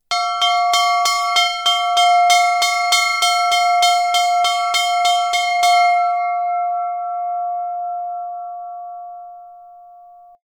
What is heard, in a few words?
Bell